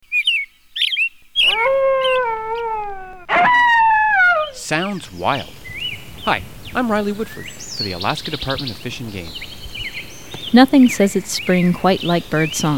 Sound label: nonvocal bird songs